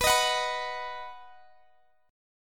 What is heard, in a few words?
B Major B down Guitar Open G